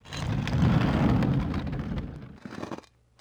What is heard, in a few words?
stones; Soldier Of Fortune II Double Helix; XBOX; ambience